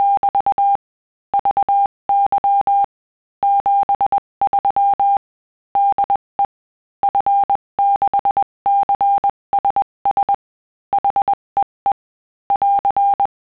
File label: cw